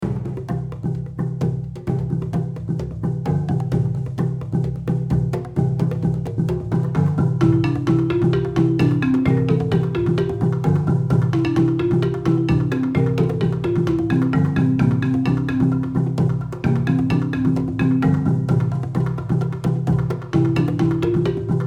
Cartoon Survival- (Loop; World 1 (Jurassic); Spunge Games

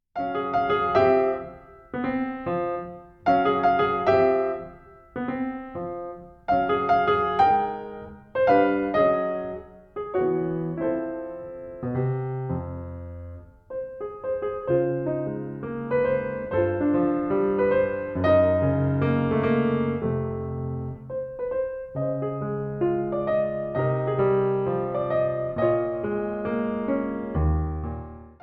A Major Sixth Down

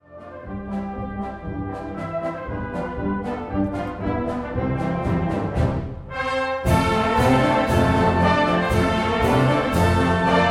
March

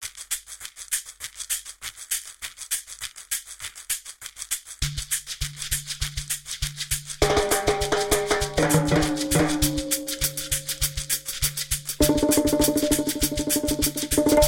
Reggaeton

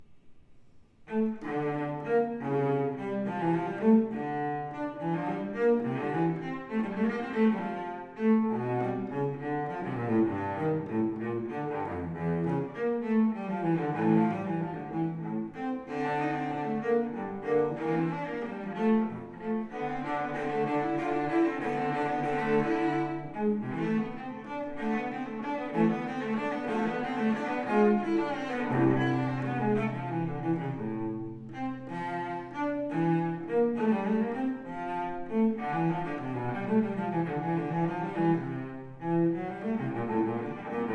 Suite No. 2 in d Minor - Gigue

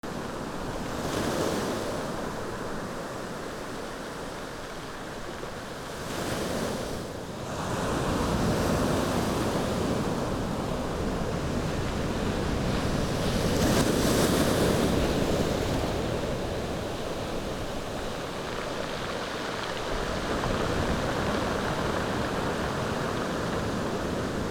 Tide Pulling Rocks Out to Sea

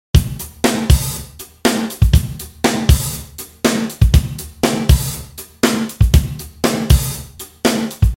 120 DRUM LOOP UPBEAT WITH SPLOOSH

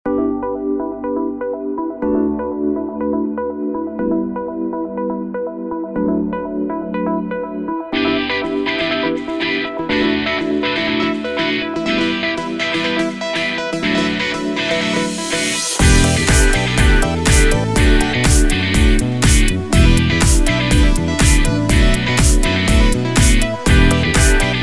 Upbeat

